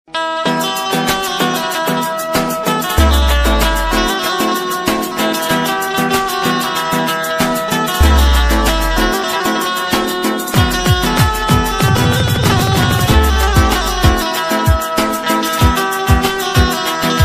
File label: gujarati ringtone music ringtone